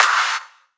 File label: Claps; Vengeance Samples